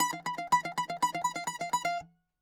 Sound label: bouzouki